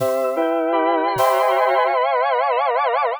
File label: celebration fanfare